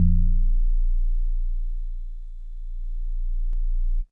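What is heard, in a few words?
House Organ DX100 A#0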